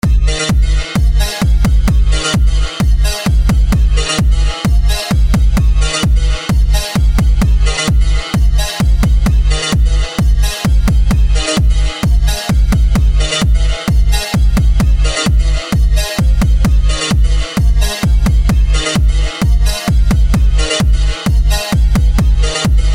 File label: Super Bass